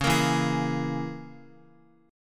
C sharp Minor 6th C sharp down Guitar; C#m6; strummed; JGuitar

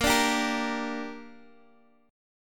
A sharp 6th, guitar chord; strummed; chordshape; x,x,8,7,8,6

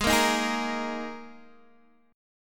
G sharp Suspended 2nd Guitar DADGAD chord, down

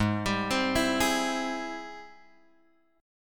G sharp Augmented Major 7th G sharp, guitar; G#+M7 Chord {4 X 5 5 5 4} arpeggiated

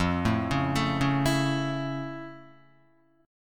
F Augmented Major 7th F Guitar; F+M7; arpeggiated

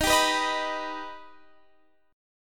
Eb Augmented Eb

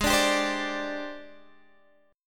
G sharp Major 7th Flat 5th, Guitar, Standard; strummed; chord; x,11,12,12,13,10

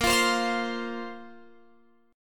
Bb Suspended 2nd Bb down Guitar Open F; Bbsus2 strummed

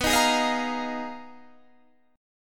Ab Minor 6th Add 9th, B, guitar chord

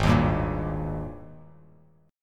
Ab Major 7th Suspended 2nd Ab down; strummed; JGuitar

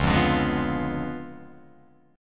C sharp 7th Flat 9th, Guitar, Open F, C#7b9 chord; strummed; JGuitar

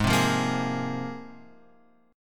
Ab 6th Add 9th Ab, Guitar, Open F; strummed; JGuitar

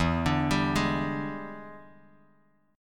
E 7th Sharp 9th E down Bass Standard

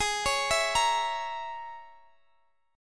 Bb Minor 7th Flat 5th

